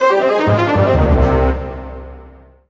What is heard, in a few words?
Orchestral Brass Negative; MUSIC EFFECT; startide